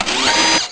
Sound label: jump; alienarena; johnny5